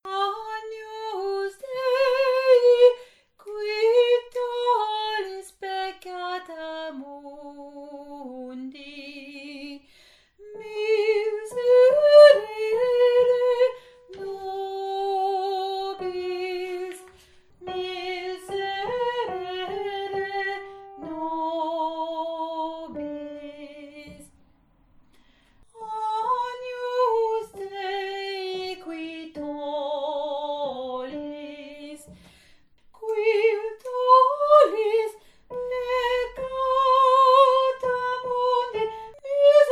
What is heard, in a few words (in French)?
agnus dei voix 1